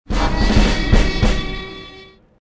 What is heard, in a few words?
ctf return; Soldier Of Fortune II Double Helix; XBOX